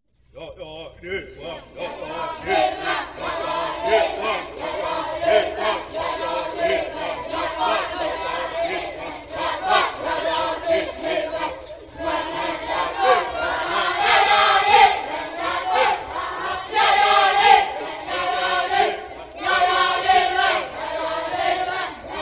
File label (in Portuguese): Canto dos Waptee